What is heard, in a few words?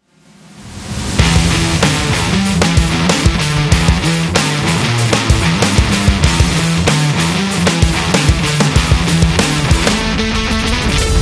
Energetic Upbeat Rock